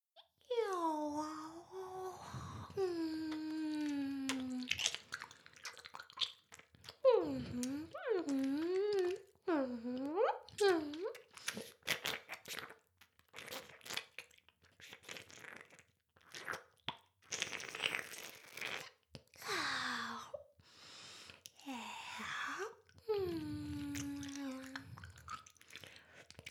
耳舐め音声; LR逆ver; 魔法少女マスカリマッスル

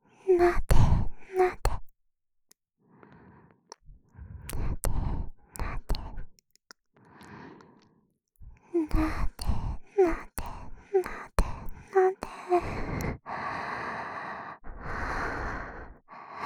なで…なで…♪, ASMR, 右耳

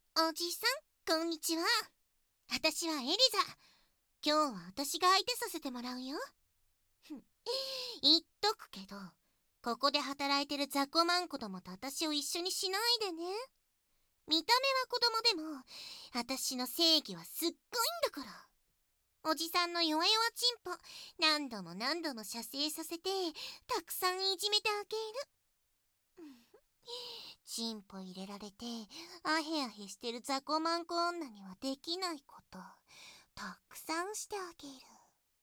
ディープキス; いきなりディープキスと手マンで即堕ち！

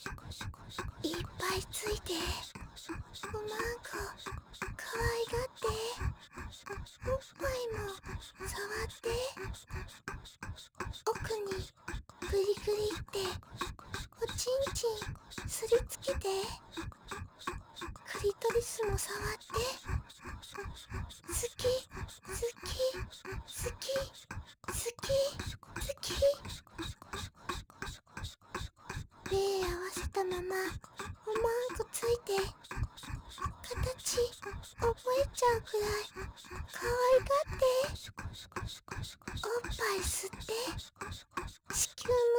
シコシコ アッアッ セリフ 早い

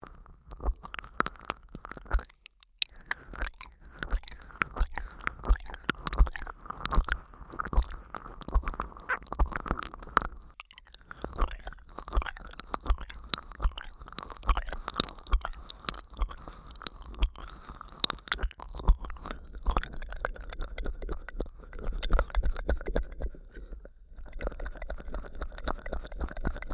耳舐め音

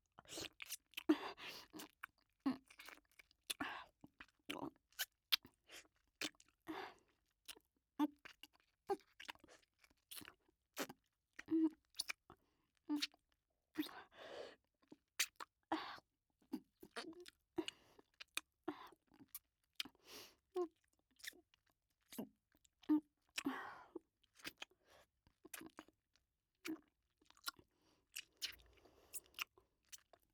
僕のディープキス

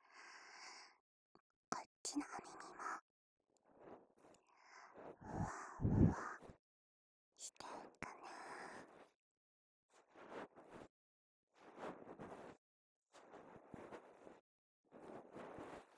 ふわふわ 梵天 左

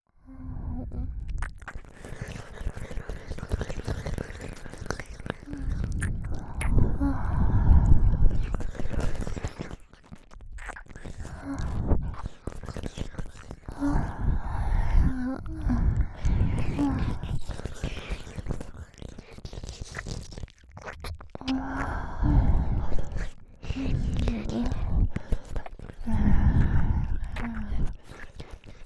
ねっとりえちえちな耳舐め; 両耳Ver

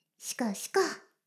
シコシコ音声, ASMR; 魔法少女マスカリマッスル

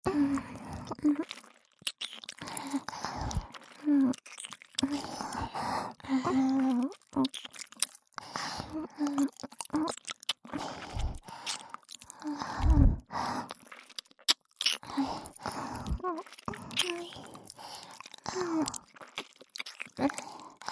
耳キス; 美月＆美星のW耳キス

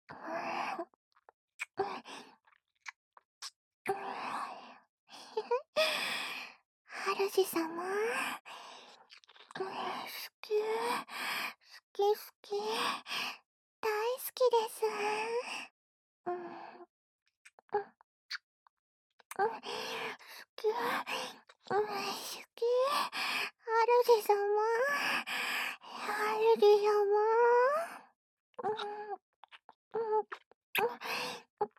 甘キスループ, ASMR; 主様しゅきしゅき大しゅきぃ♪

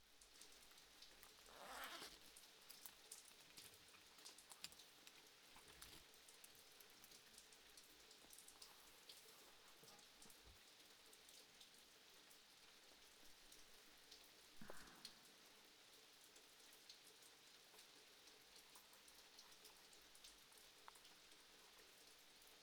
書き物作業をする＆雨の音 セリフなし

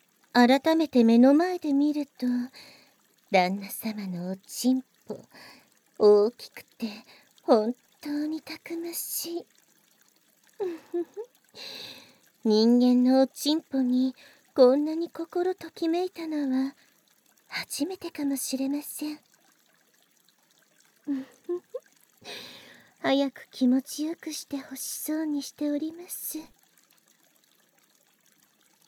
雪女のパイズリフェラと、お掃除フェラ（効果音あり大きめ 環境音）; ASMR; 超濃厚【６分半】; 効果音 環境音あり

